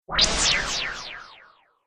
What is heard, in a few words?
Laser Swish Delay, whoosh sound effect